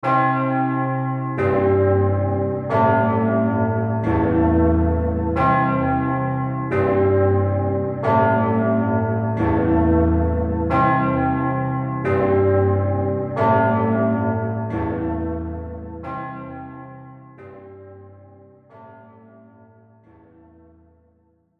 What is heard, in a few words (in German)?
parsifal gralsglocken